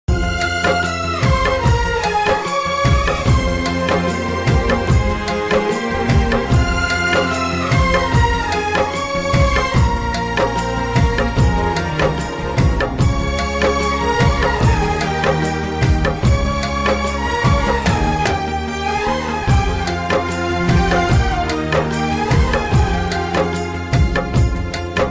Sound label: emotional ringtone